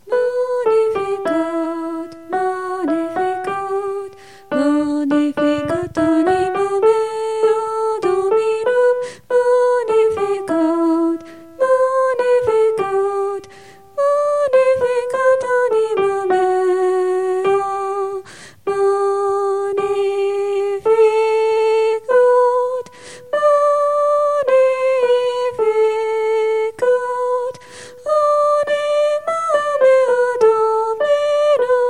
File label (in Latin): Magnificat Soprani